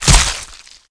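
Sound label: meat tongue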